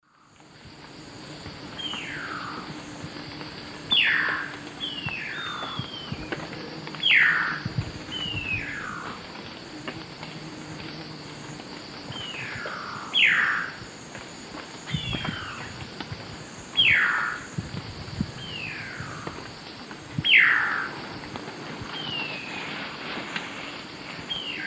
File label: Frederickena unduligera, group calls; S. America; Eastern Lowlands